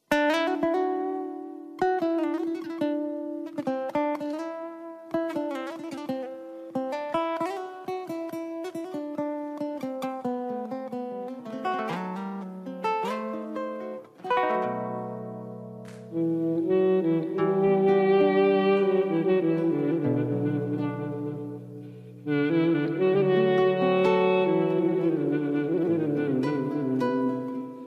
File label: ringtone sad; zang gooshi